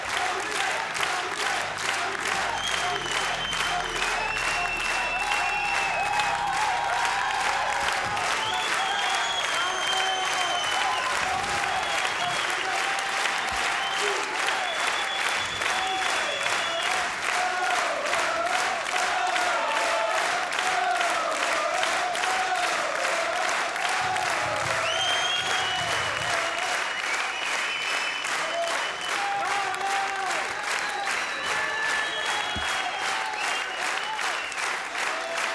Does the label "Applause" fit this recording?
Yes